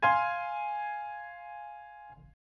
Fm higher, chords

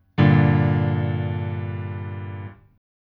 Gm lowest, chords

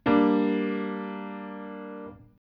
Gm lower, chords

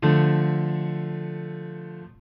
Dm lower, chords

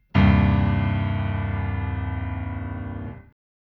Cm lowest, chords

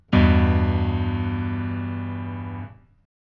Em lowest, chords

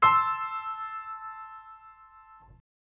Am higher, chords

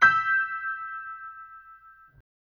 Em highest, chords

